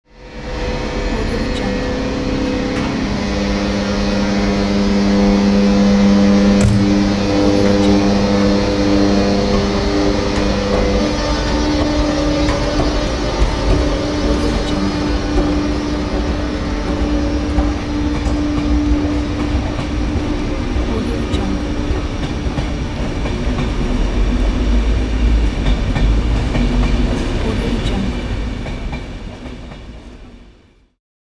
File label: train leaving the station ambience